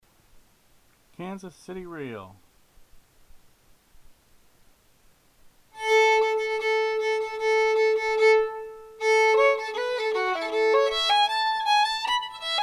Reel, fiddle; festival tunes

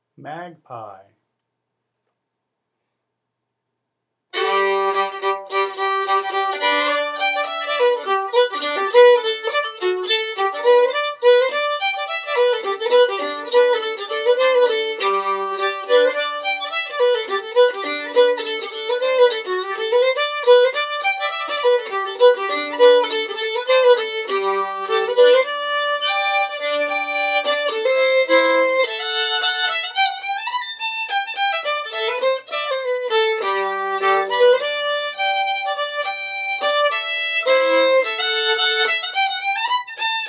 fiddle; festivaltunes

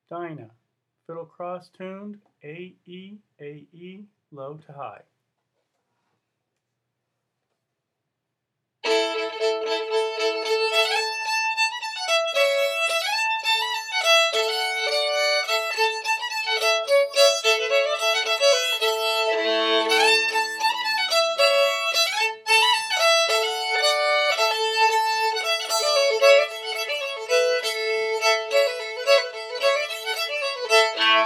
fiddle; festival tunes

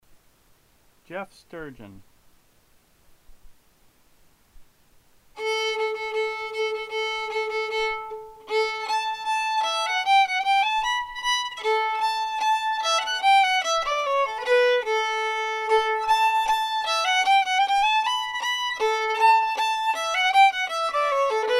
fiddle; festival tunes

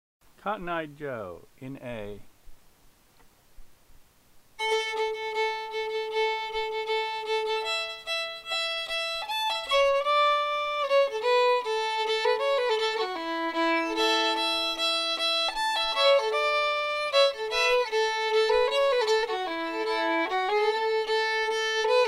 fiddle; festival tunes

